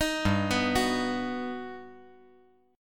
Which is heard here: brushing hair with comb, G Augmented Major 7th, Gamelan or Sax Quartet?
G Augmented Major 7th